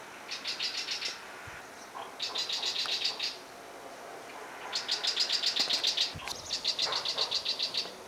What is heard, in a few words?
verso occhiocotto sylvia melanocephala sardinian warbler; Verso tipico; curruca de cabeza negra; bioacustica